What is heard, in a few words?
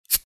Beer opening